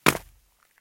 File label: concrete land; Golden Crayon Sounds